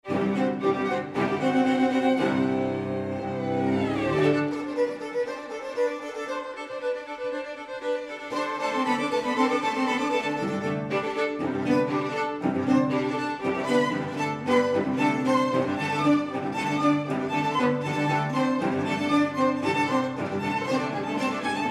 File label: string quartet